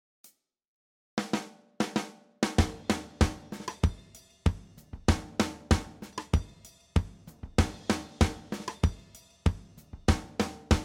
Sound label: 70s Open 4 Mic Vintage